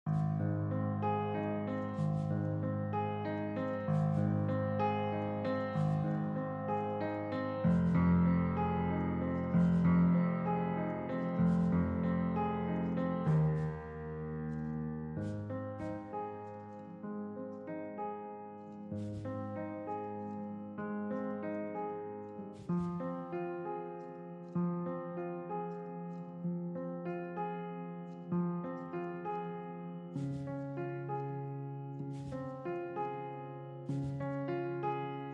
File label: Piano Clean